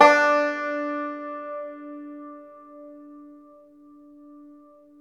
FLATTOP, Flat Top Banjo

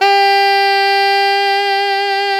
SAX ALTO FF; SAXOPHONES; Roland - LA Composer vol. 1

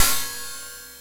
cymb, cymbals

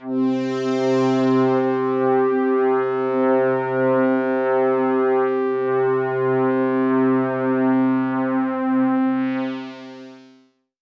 Pad C, Synth Prophet One Shots; Electronisounds

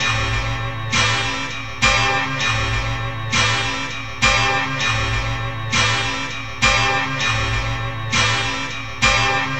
Blood Guitar, Strings; Sonic Foundry (Sony Creative Software); Myths of Technology

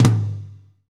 tom, Pop Country, Wet; Northstar; Drumscapes Roland

